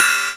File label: drum crash c sharp key